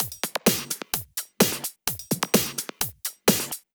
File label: Drumloops 128BPM